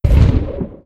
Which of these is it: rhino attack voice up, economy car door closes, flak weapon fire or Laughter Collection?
flak weapon fire